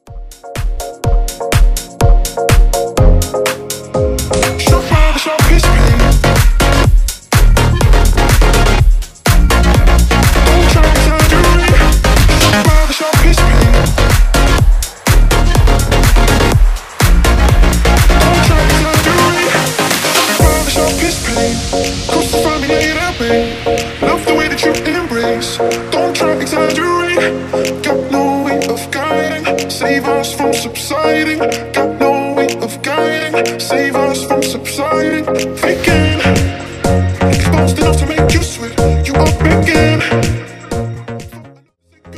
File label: Future House